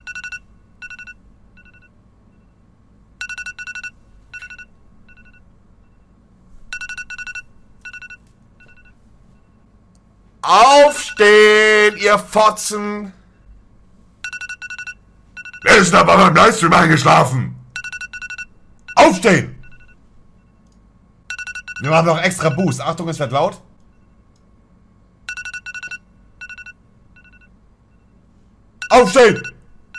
Wecker

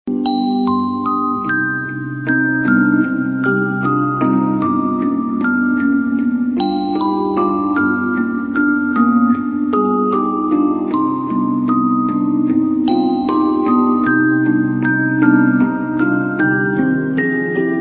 Orgel; S-moon

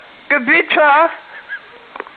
Gewitter

